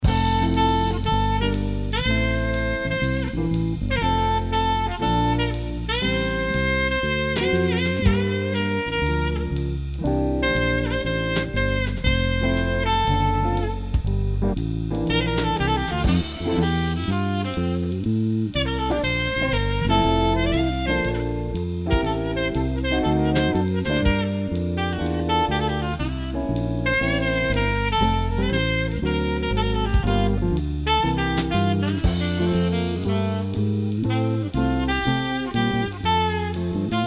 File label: Trio